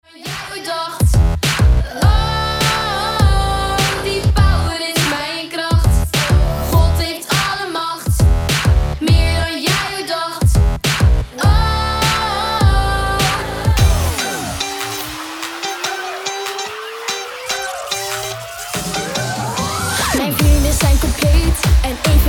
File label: DANCE mix, Kids